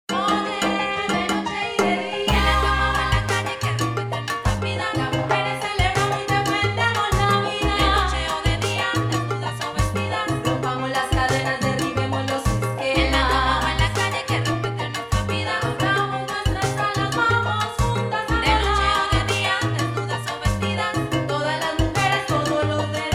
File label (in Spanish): Mujer Marcha